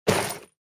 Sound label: Chainmail armor crafting